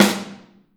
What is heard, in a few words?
Drums Percussion